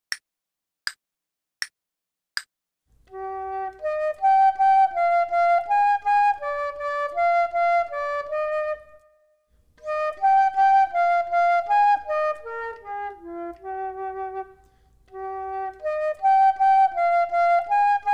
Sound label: Duets flute; Selected Duets for Flute Podcast